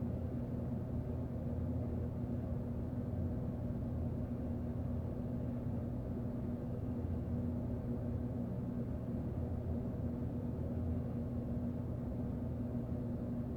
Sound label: indoor janitor room day roomtone; LOOP; anemoi